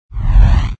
Icon Absorb; mystic training

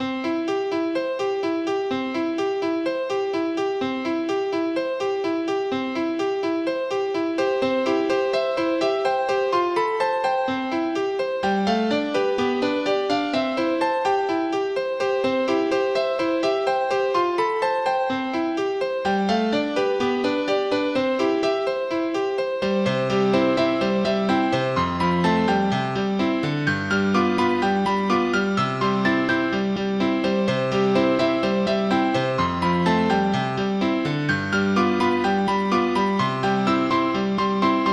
musicbox

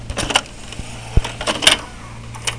VCR in; Florida State Football - Ultimate Multimedia Experience

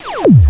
incorrect

